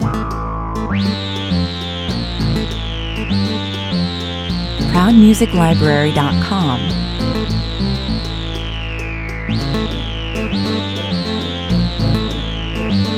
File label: elecethno (loop keyboards and synthesizer)